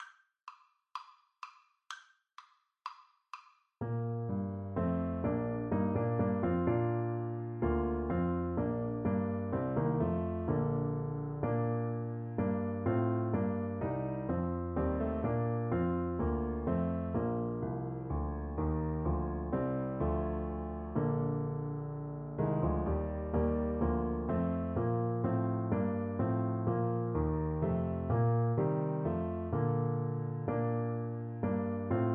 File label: up good, Trumpet